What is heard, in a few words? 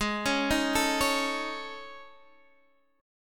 A Major 7th Flat 5th G sharp